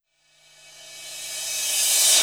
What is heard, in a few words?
cym rev